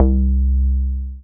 BASS MONO

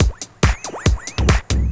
TECHNO BEATS 140

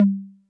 808 LO CONGA; drum

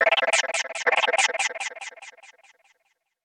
TECHNO EXTRA 140

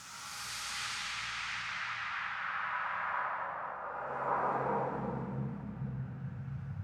SPACE FX 140; SpaceSounds

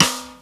RIM

